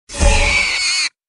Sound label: polymorph pig; world of warcraft